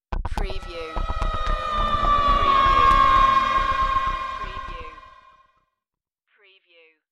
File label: Scifi Audio Logo Ident, Fall, Bubbly Reactor, Static